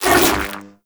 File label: Shield Drop; Warframe; Volt